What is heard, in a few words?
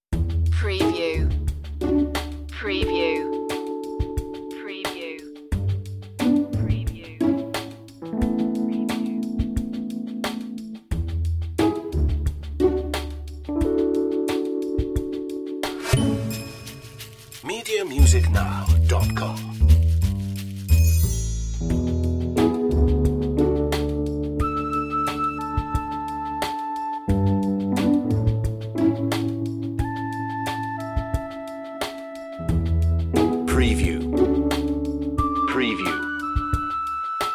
life style tv light comedy